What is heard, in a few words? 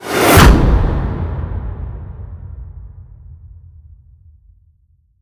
Character Introduction; Serious Sam 4